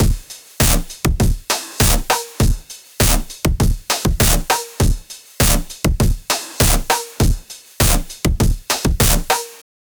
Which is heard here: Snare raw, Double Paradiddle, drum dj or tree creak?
drum dj